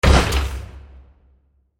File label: Enormous Impact Blast With Dropping Particles